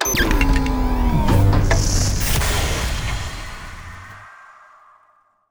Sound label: stop; planetoid; Cosmic Rage; The Experience-light